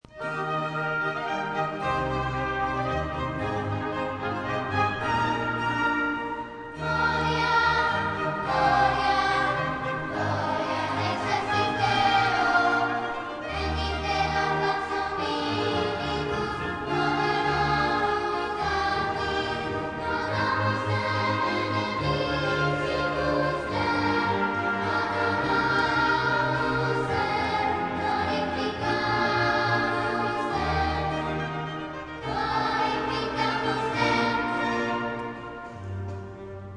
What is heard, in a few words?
Kids Gloria